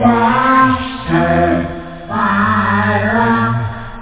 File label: vocals raise the